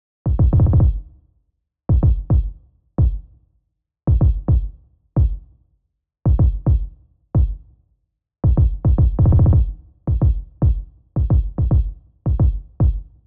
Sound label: kick